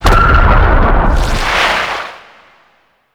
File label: Infested Radio Tower Speaker Wave; Warframe; Ambience; Nineteen Ninety Nine